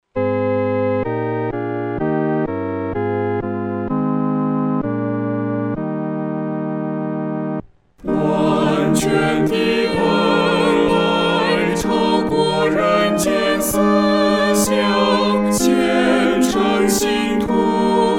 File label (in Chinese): 合唱（四声部）